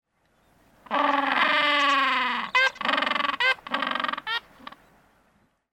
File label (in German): Eselspinguin Pygoscelis papua; Vogelstimmen